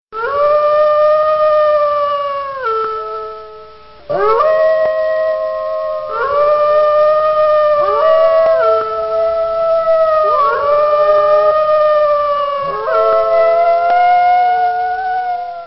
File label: wolves sound; animals